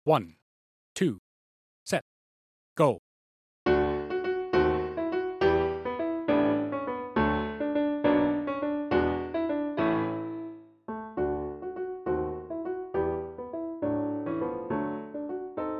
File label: 67bpm; tmea solos